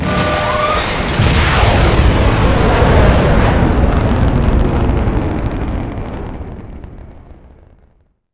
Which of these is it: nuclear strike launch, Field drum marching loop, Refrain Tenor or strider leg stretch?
nuclear strike launch